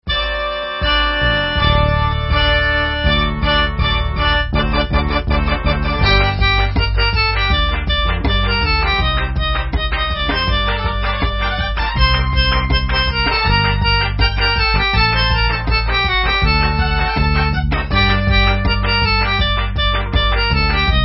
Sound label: Hora Instrumental